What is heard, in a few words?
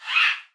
Spider Attack